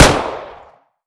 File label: pistol fire